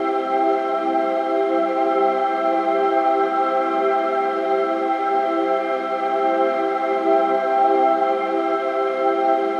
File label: Low Tide 100 C Marimba Ambience, Ambient Piano Loops; C and Dm Night Mood